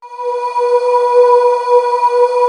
VOCAL C#4, Synclavier; ILIO; Synclavier Strings

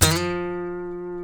G, funk guitars

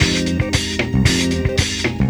Disco 114 F, Lounge Lo Fi Break Fillz

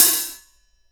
MIX HAT, NATIVE HI HAT

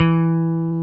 Basses, Roland

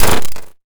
Perc Hit, drums; Drums & Drones

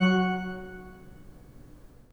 PIPE, 3DOrgans

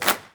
GROUP CLAP, HANDCLAPS; Spectrasonics; Heart of Africa

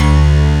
MIDI STACK G1, SYNTHESIZER; AKAI S6000 CD-ROM - Volume 1

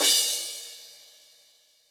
Crash B, Cymbal, Yamaha DTXpress; drum machine

